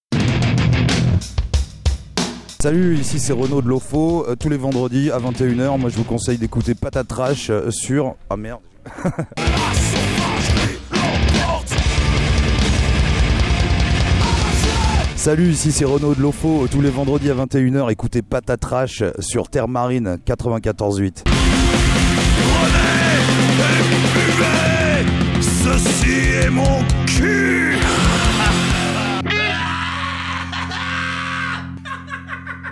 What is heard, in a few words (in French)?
jingle